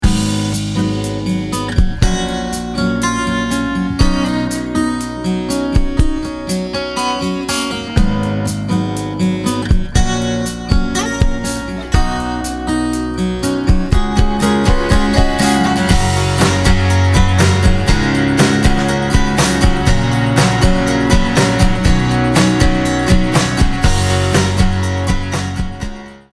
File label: Acoustic